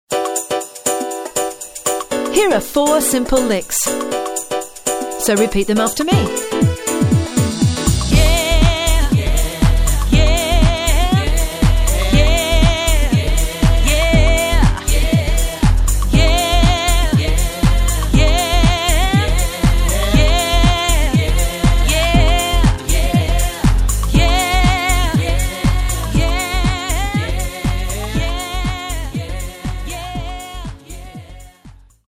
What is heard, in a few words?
licks exercise